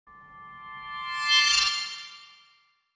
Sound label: ambient